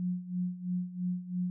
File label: quiet needs normalization